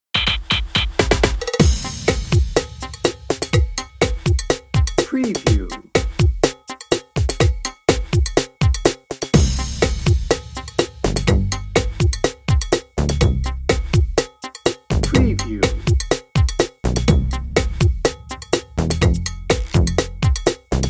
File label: cha cha hip house dnb